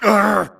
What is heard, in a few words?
grunt hits; Titanfall 2